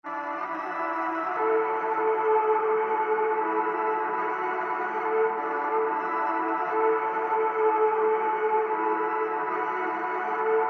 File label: Ambiance